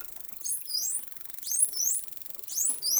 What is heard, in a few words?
Whistles; WWF